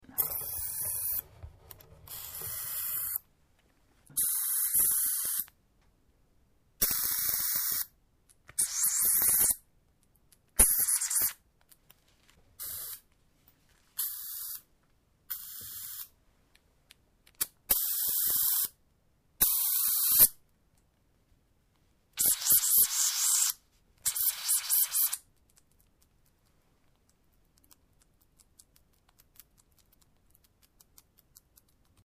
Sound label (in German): zweifarbzetern, Tierstimmen; Schleswig-Holstein